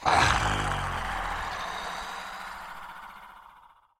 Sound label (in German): Monster